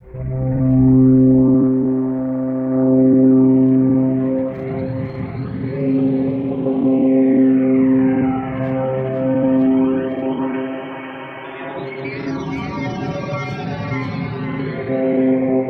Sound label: FLOW SOUNDSCAPE C